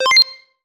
Beep Good

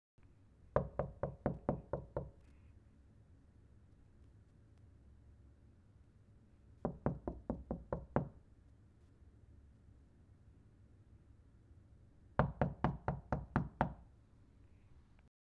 Knocking On Wall Impatiently

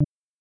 Btn Hover

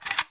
Click

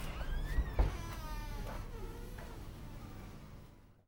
Door Opening

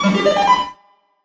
Bloop

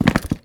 Wood Footstep

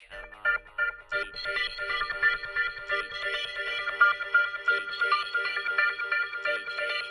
Come On Dj 135 Bpms

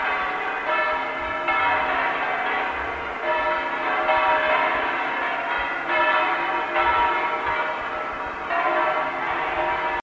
Bells Pealing Cathedral Distant

